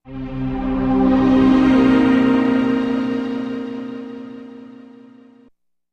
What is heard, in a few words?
Deep String Low Voice, stinger